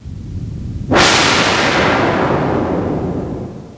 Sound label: Rocket Takeoff; Working Model Program